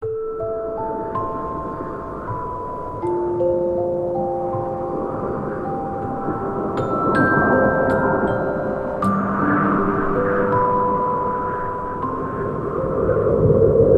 weather alarm snow; OnePlus; ui; OnePlus 11

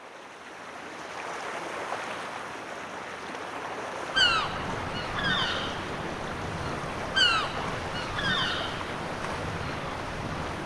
Seacoast, alarms; Allview Soul X6 Xtreme

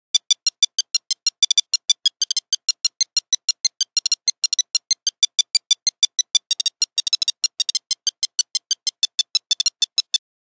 Nokia 8310 Private eye, Nokia Buzzer; Windows Phone apps

